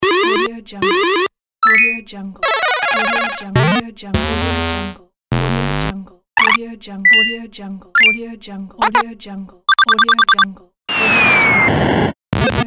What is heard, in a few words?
8 bit pack